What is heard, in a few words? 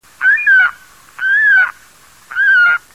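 saker falcon voice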